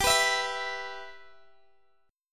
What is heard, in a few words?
G 6th, Guitar, Open D